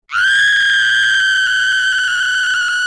Scream; DBD

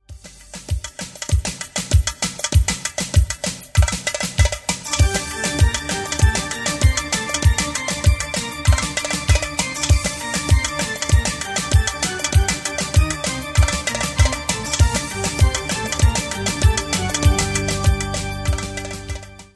Oriental classics

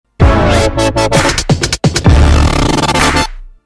Trap Scratch

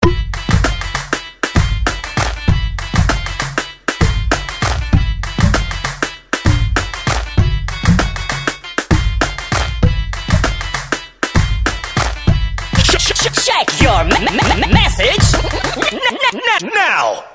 Message Beat

